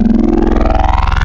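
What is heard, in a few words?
Vocal Nasal, wavetable; Synthetic's Serum Collection; Echo Sound Works Core Tables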